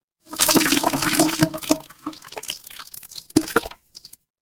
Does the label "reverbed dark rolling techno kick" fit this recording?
No